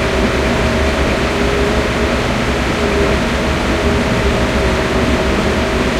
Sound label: panzer6 engine int rpm, engines tanks; War Thunder